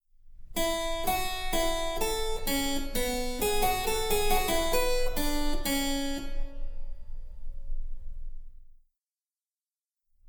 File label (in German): Cembalo; Mark Lindley WTC 1